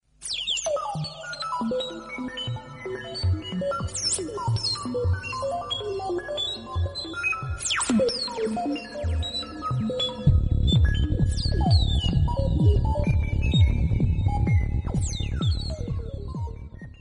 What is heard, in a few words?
english movie theme ringtone